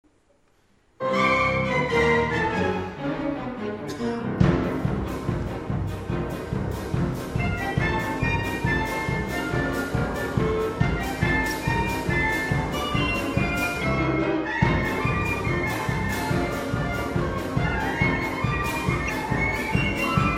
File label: barrel organ monkey chamber